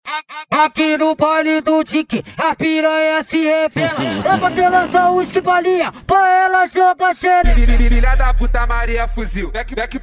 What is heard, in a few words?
mega bass